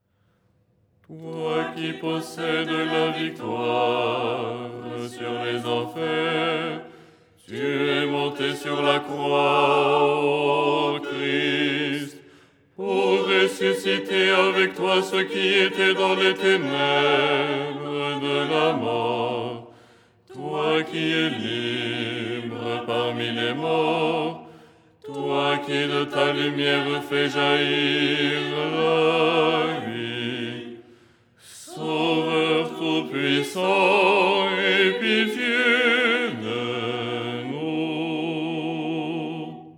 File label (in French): basse; Au lucernaire, 1ère stichère du dimanche; Les 8 tons en français; ton 6